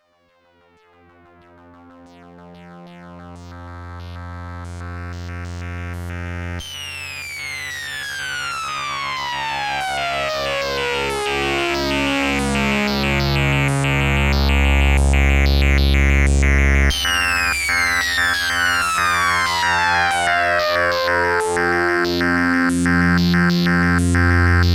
Waldorf Rocket Dual Osc Sync Arpeggio

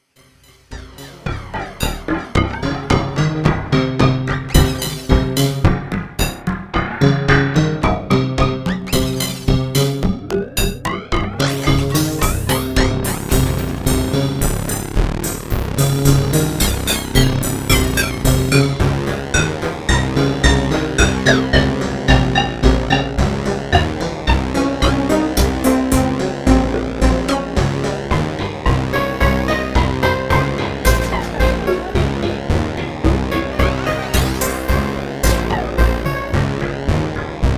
SUPER6 FX, synthesizer

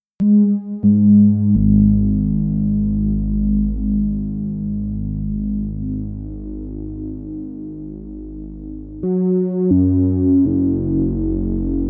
two voice pro vcf

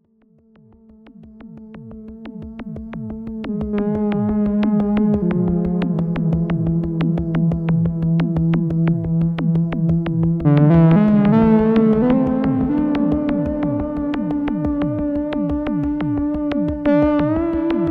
GRP A1 Soft Lead

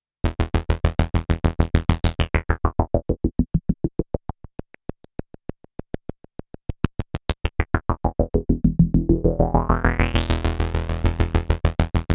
nasty bass arp

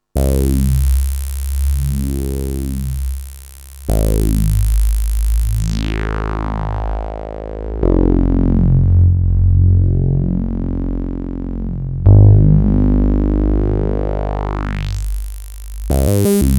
bass live

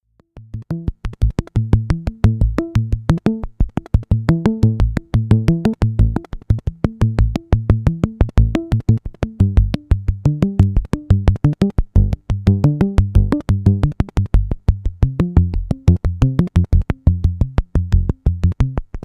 Jupiter 4 sequ solo

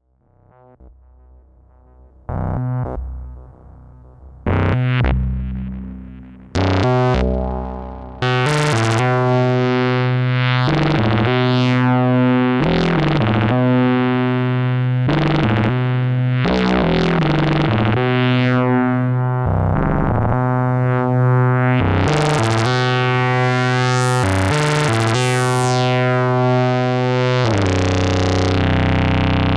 spectrum2 key RPT

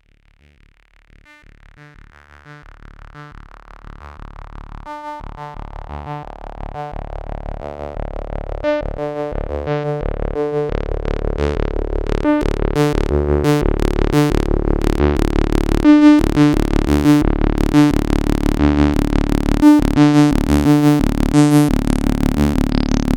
bandpass sequ

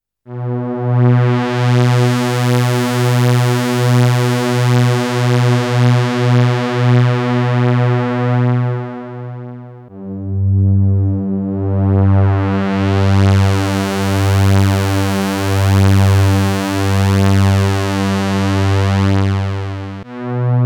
A2 Sawtooth 2 VCOs Lead, synthesizer

